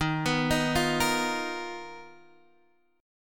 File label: B Major Sharp 11th Eb